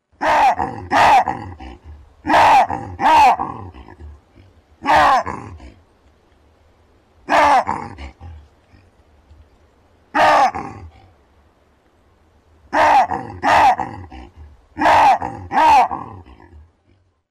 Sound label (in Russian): zvuki babuina